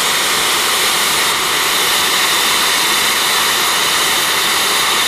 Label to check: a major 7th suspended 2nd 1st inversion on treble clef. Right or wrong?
Wrong